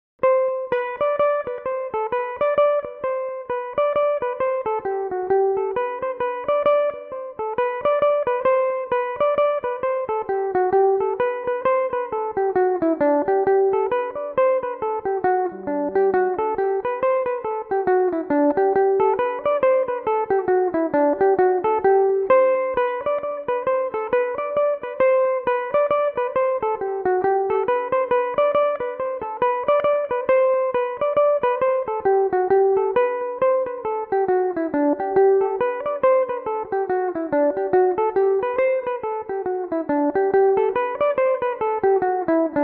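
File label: jig G major, Irish mandolin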